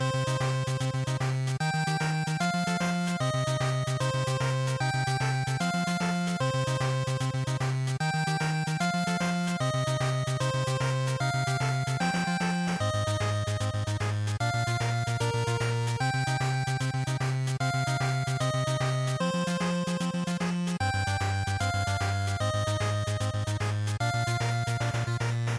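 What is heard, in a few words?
Game Boy Action Title Loop, bgm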